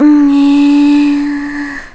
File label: Tetra Thinking; Tetra thinking of a plan